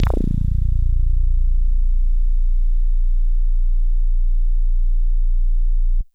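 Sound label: Synthe Bass DX100 A#6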